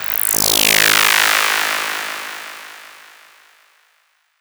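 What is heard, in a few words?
SYNTH, 160 BPM; Ueberschall; Drum & Bass Flash Trackz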